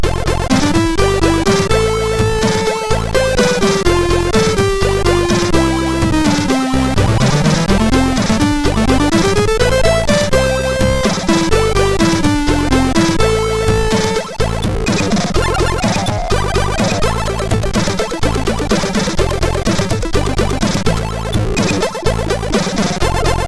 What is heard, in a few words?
sid MOS6581R4